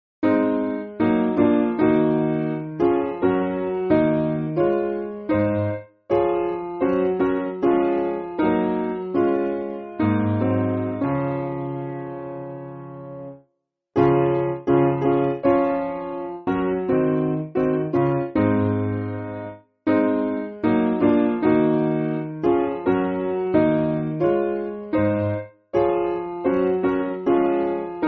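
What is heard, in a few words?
Piano, Backing Files for Churches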